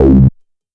Synth Note one shot; Sonic Foundry (Sony Creative Software); Electronic Point Blank

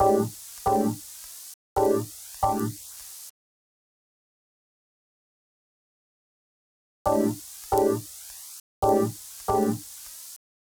Vegas Piano Dry-G, 136; Zero-G Kocktail Kollection; Construction Kitz (Lounge); Vegas Time 136

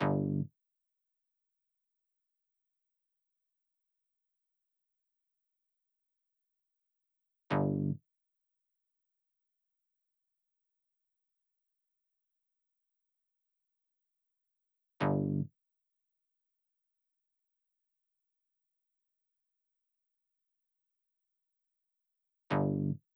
Drum Loop 128BPM Bass shot G; Loop Elements; VEC5; Drumloops; Multi Loops

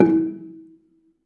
BELA E3, BELAFON, Asian Traditions; USB Soundscan vol.60; AKAI

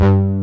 DEEPER BS; Pro-Rec - Synthsonic; TRINITY

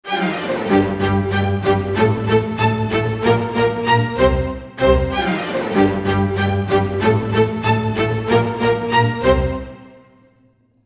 g min full mix, Orchestral arrangements; PowerFX - Downtown Orchestra

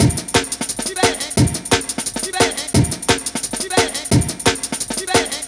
175, classic dnb breaks

